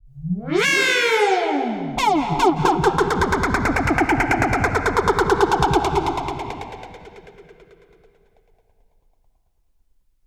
Sweeping FX; Sonic Foundry (Sony Creative Software); Dr. Fink's Funk Factory

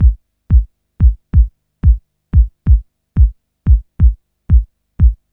Kick Loop, Drum & Bass

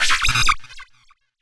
Bleepz, sci-fi

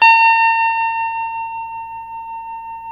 TELE TREM B 5A, Guitars; Spectrasonics; Hans Zimmer Guitars VOL-1; TREMO-LUXURY

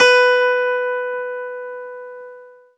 ZITHER, Alpine Volksmusik

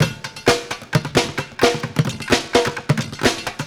Street Groove, Ambient Grooves

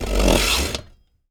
STEEL, SAWING; AKAI S6000 CD-ROM - Volume 6

